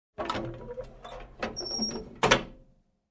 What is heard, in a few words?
Closing compartment